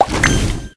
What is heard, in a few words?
fish created; Candy Crush Friends